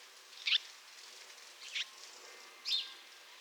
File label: Passer domesticus; SETE